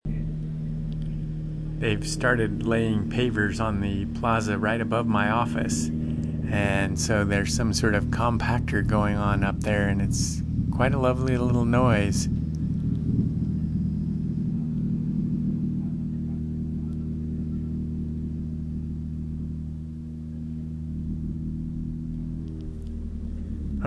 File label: office noise